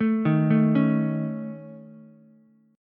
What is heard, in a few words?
C sharp Suspended 2nd Sharp 5th C sharp Ukulele; C#sus2#5; arpeggiated; JGuitar